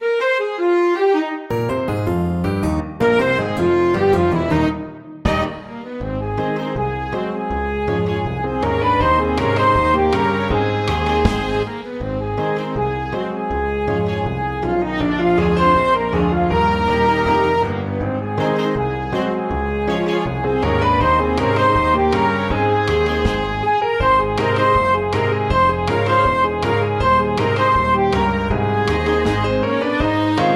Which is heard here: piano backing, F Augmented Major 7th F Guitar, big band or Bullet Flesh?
big band